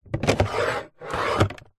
household drinking glasses new slide in or out of box